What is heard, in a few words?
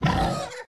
Damage